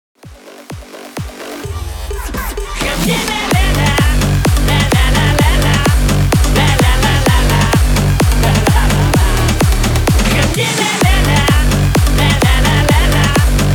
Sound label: BIG ROOM 128 BPM